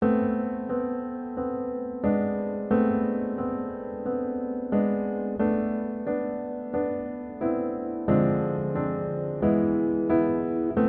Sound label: Female